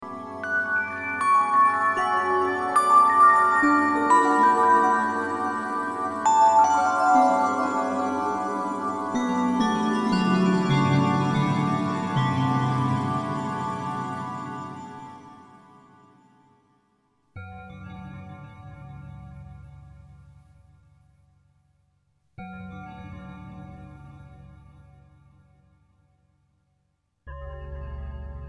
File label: Final Bell Threshold; Exeter